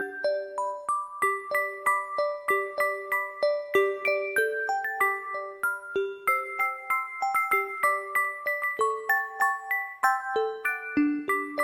jingle bells soft ringtone

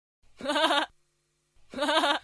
laugh funny ringtone

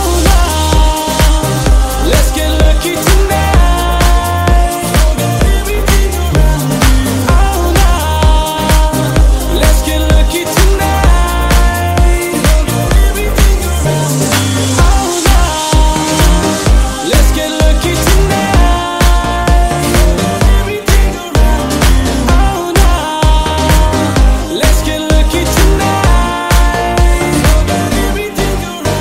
best dj remix ringtone, bollywood ringtones

